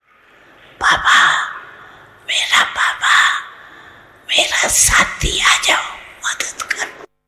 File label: with music; Shortest version